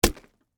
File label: Impact Orange, Texture, Impact, Orange, Fruit; Miscellaneous Impact Sound Effects